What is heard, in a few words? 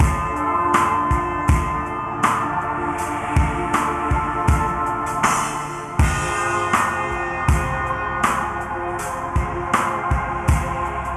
LoFi, horror